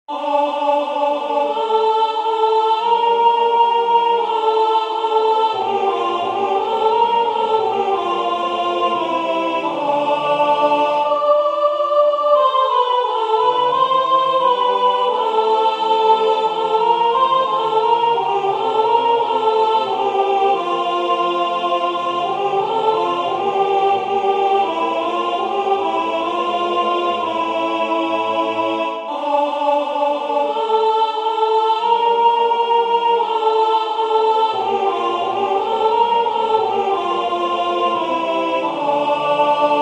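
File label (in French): soprane, Musique sacrée; O Dieu de clemence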